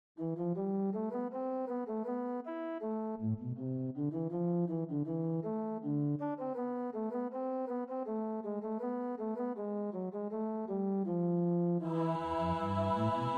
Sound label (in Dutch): alt, koorpartij oefening; Bach (Johann Sebastiaan); BWV 016; 1 Herr Gott, dich loben wir